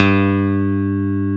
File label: ELEPI G1, electric piano; ELECTRIC PF#3